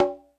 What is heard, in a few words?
FX Sounds, Drum Machines